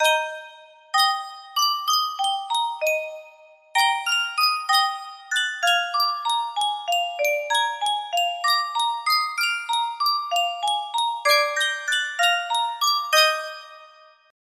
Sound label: superstar music box